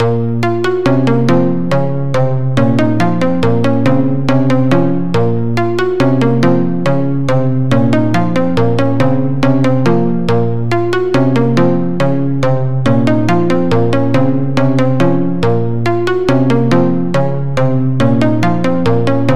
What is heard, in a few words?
pluck, Sylenth1; Street Ninja; Routed Sound; Sylenth1 Expansion - Street Vanquisher Vol.1